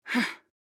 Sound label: Grunt Attack; Seris; Paladins